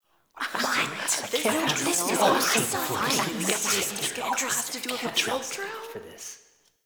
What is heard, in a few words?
Student Excited; Cinematics; Warframe; TheNewWar; Zariman